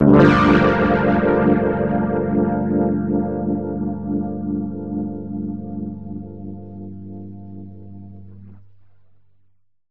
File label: chorus